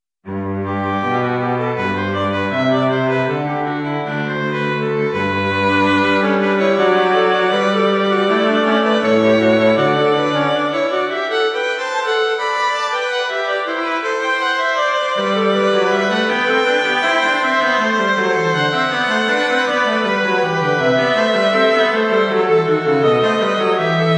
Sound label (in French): cordes; bach johann sebastian; 15 inventions a 3 voix